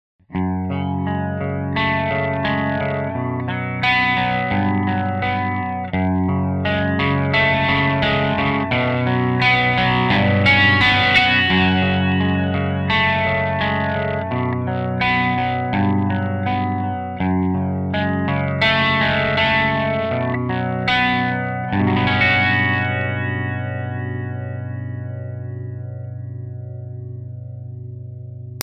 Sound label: Crunch arp